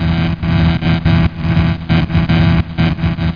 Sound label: lamp; CHASM